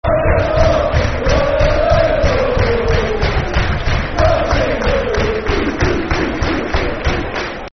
Football Chants (Soccer Song); Birmingham City Bcfc